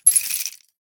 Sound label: ammo fly; smite; zhongkui